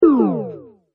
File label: lost explode